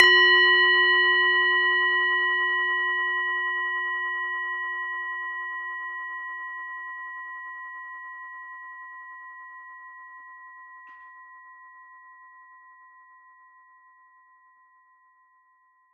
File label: Strike, Tibetan Singing Bowls, Large Bowl; Percussion Samples